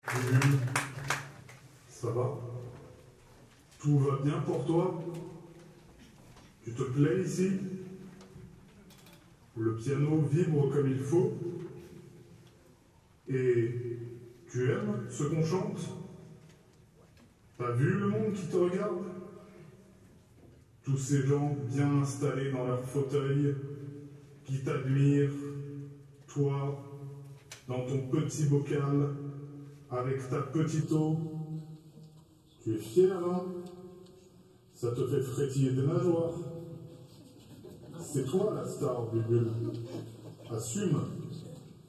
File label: transition, Concert; IPC Chatou